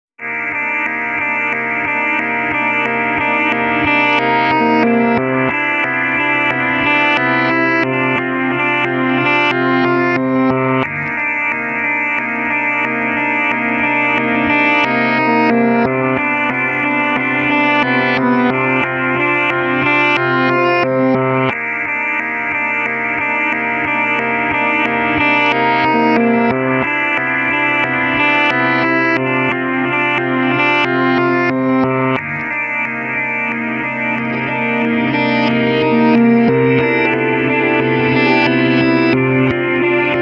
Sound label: Emotive Jangly delayed guitar alone; CUES for film TV games etc; SAD